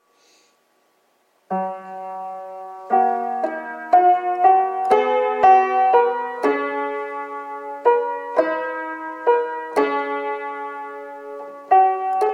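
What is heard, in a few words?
Communion Antiphon; 3rd SUN Advent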